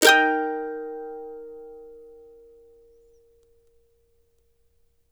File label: CEE CHORDS D#, Blues Guitar Samples